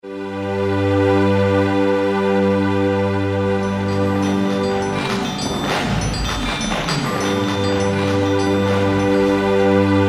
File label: Indus, Yamaha TG33